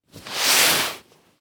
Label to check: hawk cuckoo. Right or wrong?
Wrong